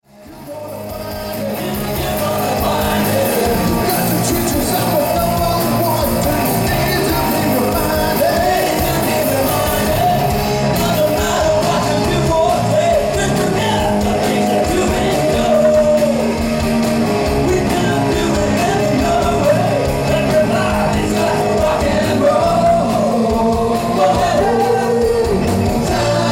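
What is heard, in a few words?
Rock Tribute Band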